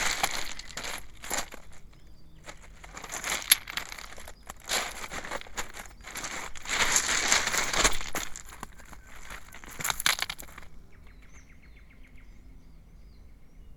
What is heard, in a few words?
soundwalk, sergiyevposad